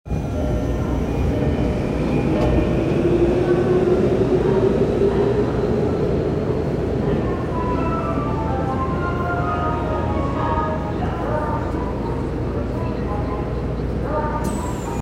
南北線本駒込北, train sounds

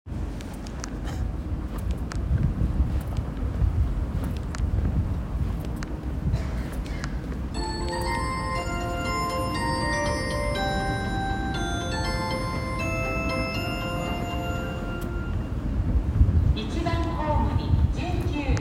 小田急線祖師ヶ谷大蔵西接近と発車

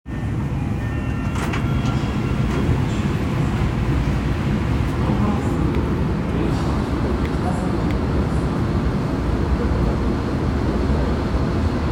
日比谷線霞ヶ関北, train sounds

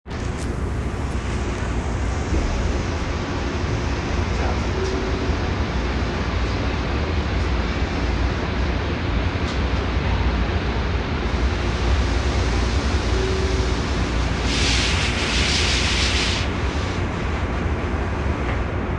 有楽町線和光市東, train sounds

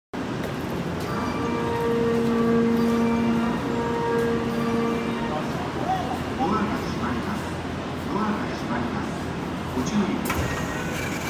発車, train sounds; 大阪城公園

